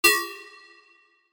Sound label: sound design metal hit high pitched